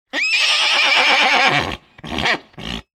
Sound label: horse sound